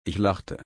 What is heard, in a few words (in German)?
lachen